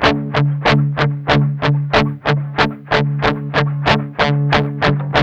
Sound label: MED ROCK 8TH D#3, Guitars; Spectrasonics; Hans Zimmer Guitars VOL-1; 102-MED ROCK